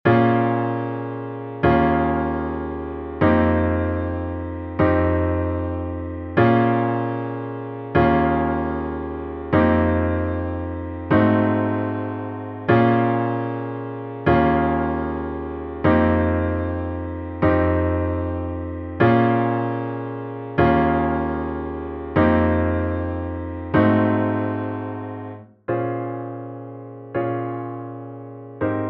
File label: CHORD PROGRESISONS Jazz